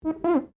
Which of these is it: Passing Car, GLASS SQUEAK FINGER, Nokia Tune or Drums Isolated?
GLASS SQUEAK FINGER